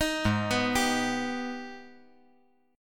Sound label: G sharp Minor Major 7th G sharp down; arpeggiated; JGuitar